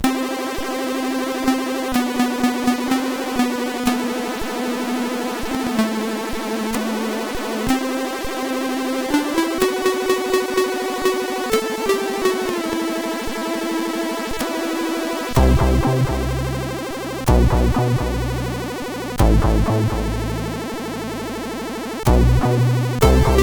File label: music, sid, CSG8580R5